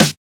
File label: Snare; FLPRO